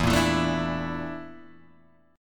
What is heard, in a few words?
Bb Major 7th Flat 5th